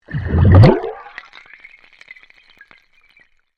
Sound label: Underwater Airhorn Burst Close, compressed air airhorn recorded underwater